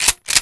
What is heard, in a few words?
shotgun reload; ShadowMan; weapons